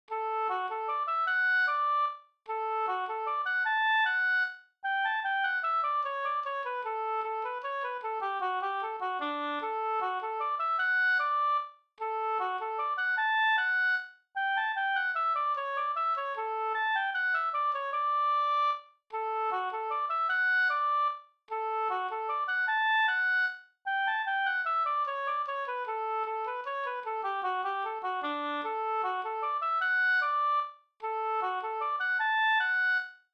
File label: mazurka; Backens mazurka av Claes Hagstrom Trosa